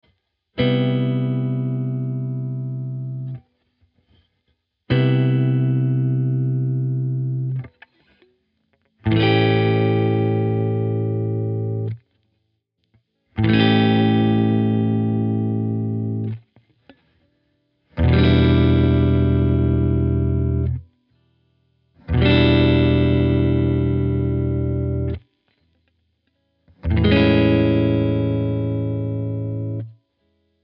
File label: 7th chords